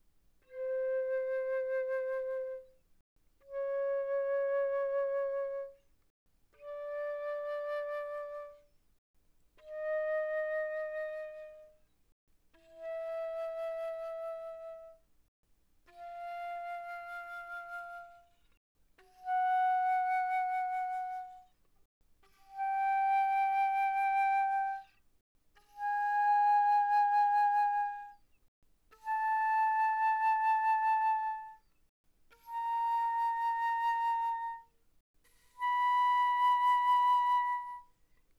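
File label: Flute vibrato pp C5 B5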